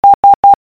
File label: S morse code